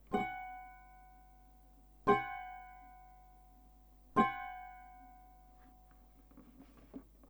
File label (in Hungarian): felső hangok, guitar